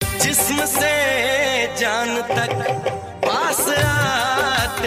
Love Old Ringtone

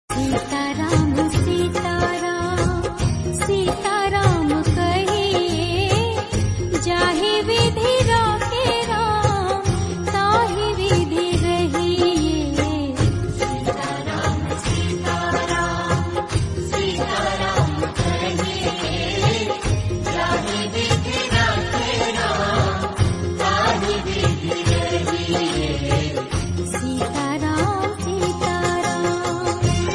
Bhakti Ringtone

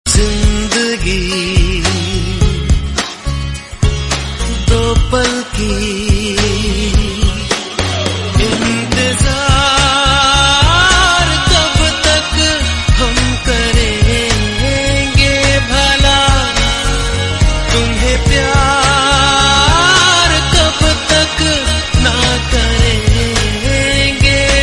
Incoming Call Song Ringtone

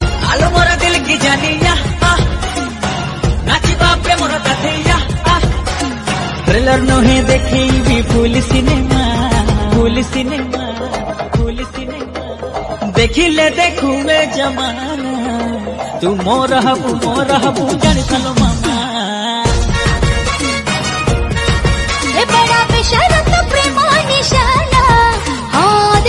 Odia Song Ringtone

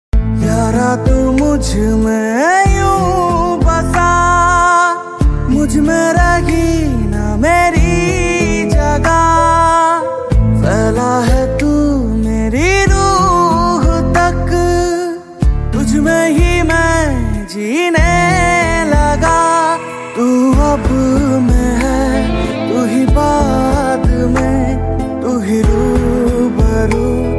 Hindi Song Ringtone

